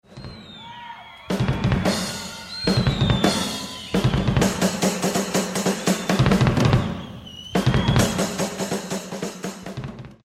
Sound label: snare splash combo